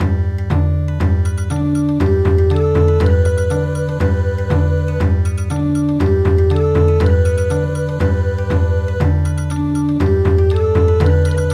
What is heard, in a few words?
Bon Odori